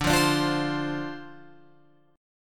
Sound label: D-Minor 7th D Guitar Open G, chord